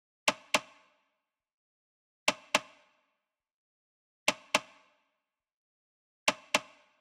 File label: Perc Loop, EDM 120 bpm; Future House; Drop; Ableton Projects; Big EDM 120 bpm (Drop) Project